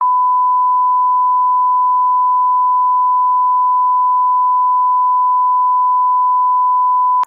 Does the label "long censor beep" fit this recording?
Yes